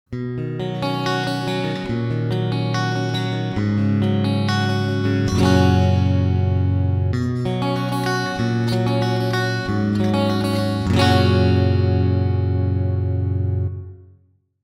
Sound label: Rhythm Clean Rev; Mercury2CP